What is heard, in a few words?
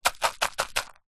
food dried chillies toss in plastic pot; zapsplat